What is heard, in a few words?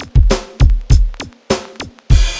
drloop, drumloops, Vinyl Attack; Masterbits